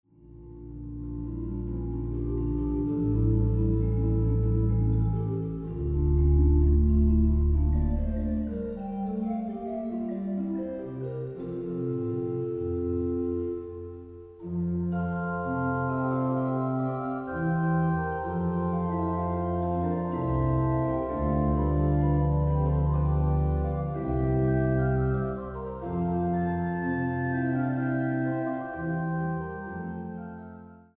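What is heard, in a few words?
orgue; Dietrich Buxtehude; Passacaglia in d BuxWV 161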